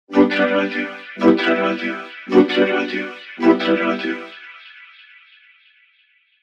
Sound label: radio jingle